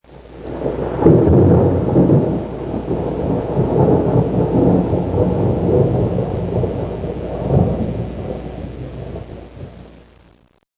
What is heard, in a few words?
Thunder